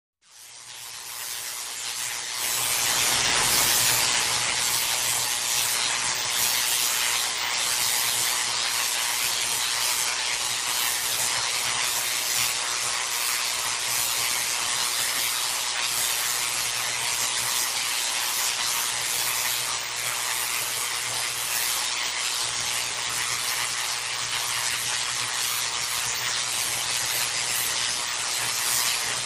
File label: Arc Welder Hi Sizzle, welding